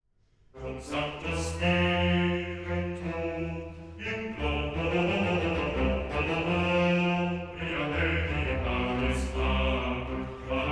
Cum Sancto Spiritu Chorus

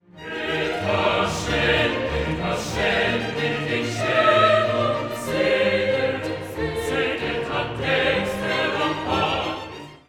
Credo Et ascendit

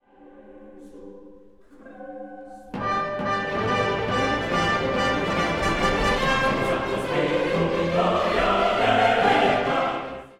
assertive fanfare; K337; Gloria

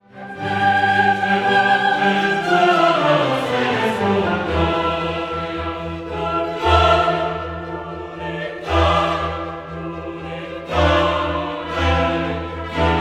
Credo Et iterum venturus

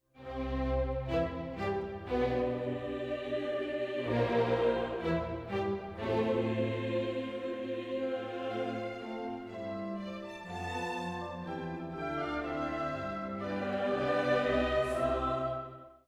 Chorus emerges; Kyrie

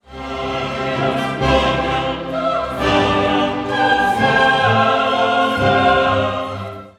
Gloria Quoniam

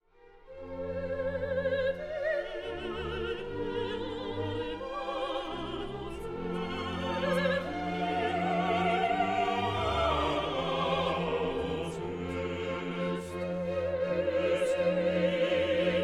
Credo Et Incarnatus

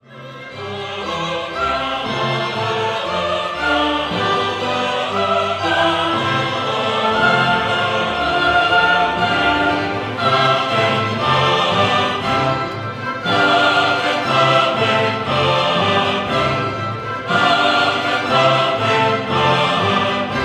Gloria Extended amen

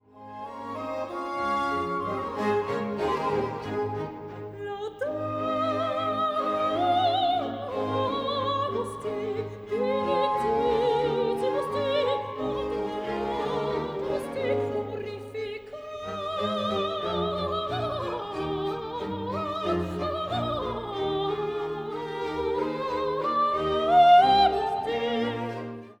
Laudamus te; Stamitz

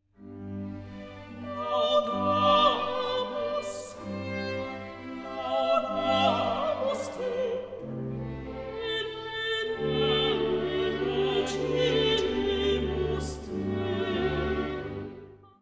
Laudamus te

